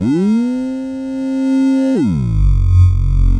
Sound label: voxy bass alarm; Basses